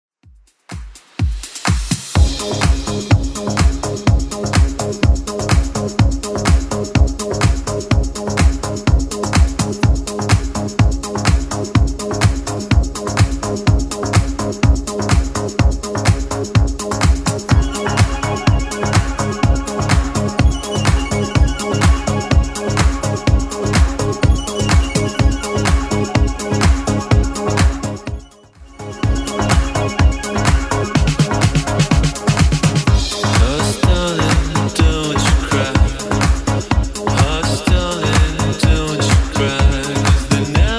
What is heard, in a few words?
80s Club 125 bpm; 80s Club Edit